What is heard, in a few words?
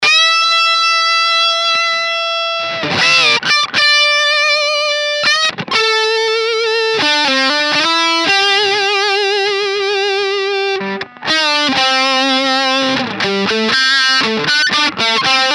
solo reamp reload loadbox output clean amp lead wos cab sim